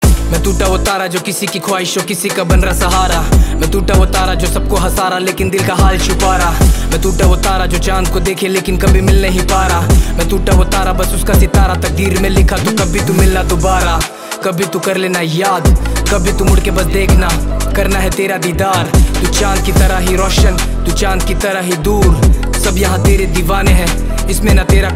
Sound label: Ringtone